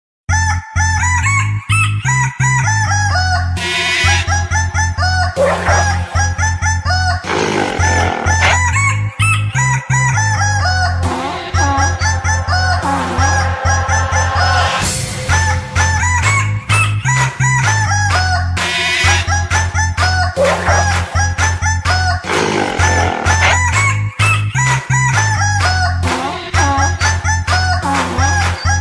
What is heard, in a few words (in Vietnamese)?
tieng dong vat